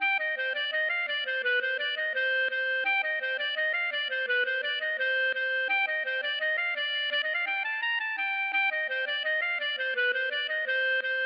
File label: gavotte